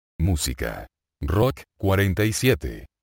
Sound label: rock